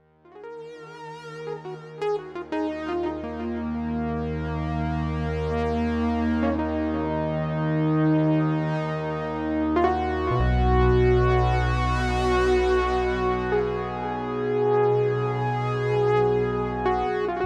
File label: s3 expressive, synthesizers